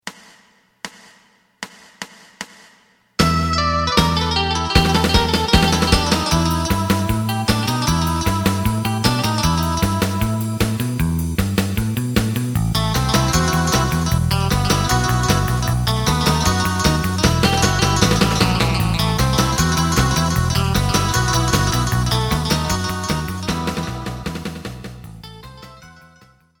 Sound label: side guitar